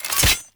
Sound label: Drone Blade Hit; Warframe